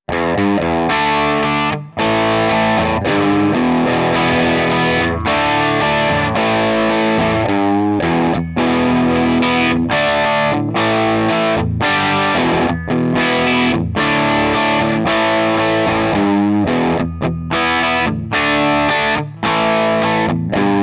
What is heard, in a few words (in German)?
Epiphone Les Paul HB Trebel an DigiTech RP70 Modell Marshall JCM800; Gitarrenvergleich